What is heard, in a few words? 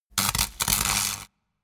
spark; comedy cartoon; elektronisch